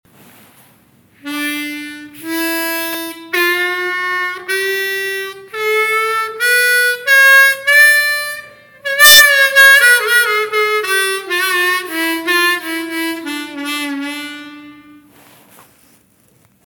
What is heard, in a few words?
Harmonica; Mahur